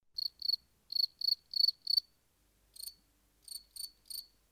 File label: crickets